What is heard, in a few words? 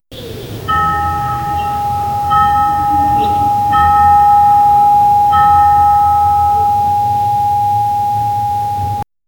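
Indian temple morning ambience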